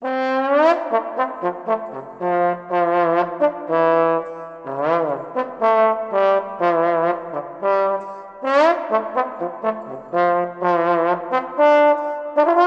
Trombone Sound

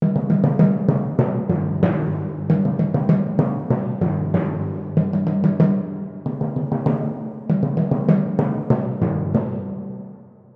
Timpani

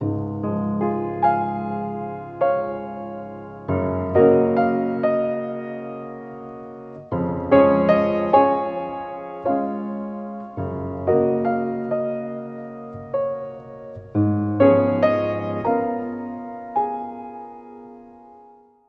Piano Sound

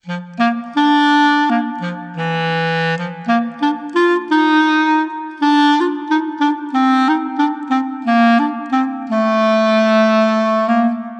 Clarinet

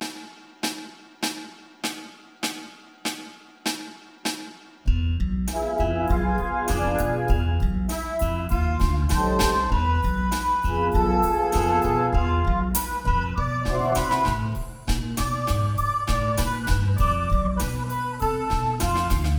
Recorder; Learning Speed

